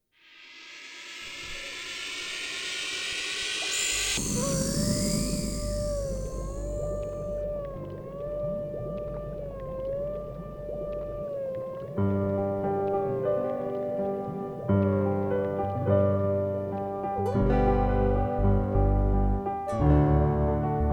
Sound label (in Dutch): Het spookje (zonder zang); Bieb Almere